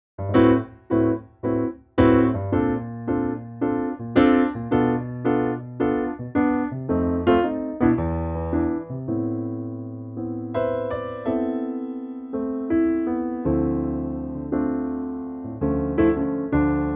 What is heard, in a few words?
Am swing Piano; key - Am - vocal range - G# to B; fresh lightly swinging piano only arrangement